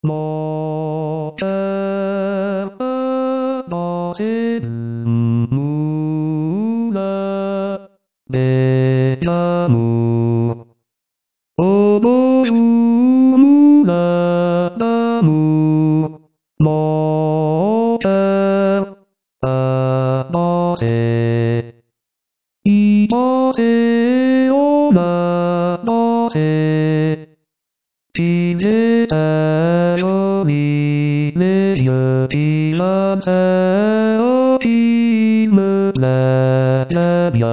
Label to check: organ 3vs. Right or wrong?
Wrong